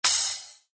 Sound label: cymbal; drum pad